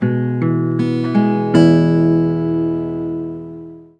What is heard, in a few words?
LUTE